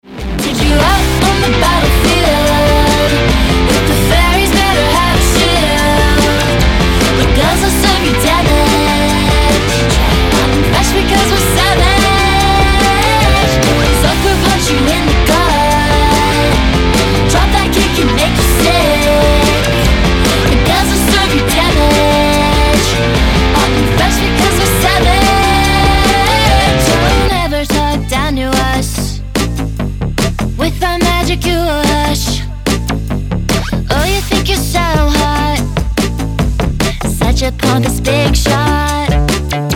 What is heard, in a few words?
ROCK POP